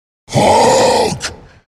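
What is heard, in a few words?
hulk scream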